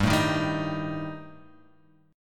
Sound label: G Suspended 2nd Sharp 5th